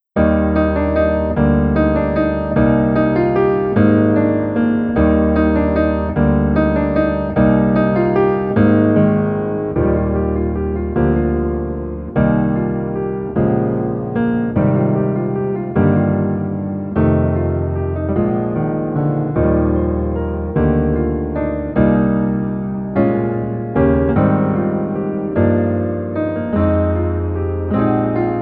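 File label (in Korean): Piano version 멜로디 MR 남자키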